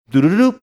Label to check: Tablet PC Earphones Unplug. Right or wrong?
Wrong